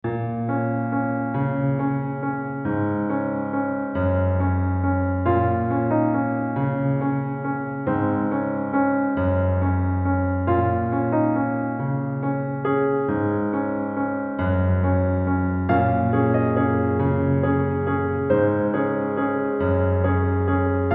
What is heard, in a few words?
INST Piano Theme